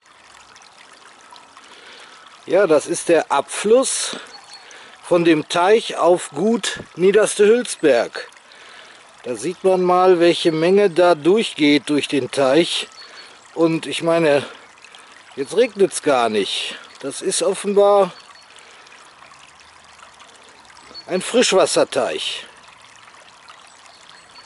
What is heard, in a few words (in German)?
Abfluss aus Frischwasser Teich